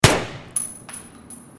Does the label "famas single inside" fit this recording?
Yes